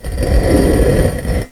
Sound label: Scrape, pinball